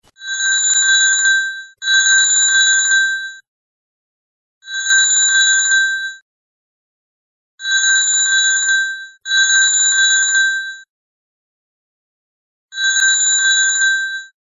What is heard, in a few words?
Classic Iphone5